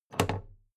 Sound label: big plastic case handle bounce heavy